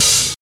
Dry Open Hat Sound G Key, Royality free open hi hat sample tuned to the G note.